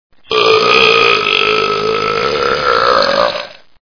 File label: belch; ULT BELCH